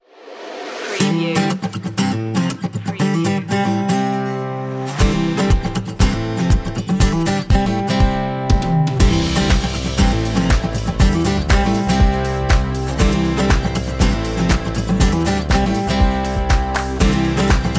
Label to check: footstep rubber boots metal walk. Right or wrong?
Wrong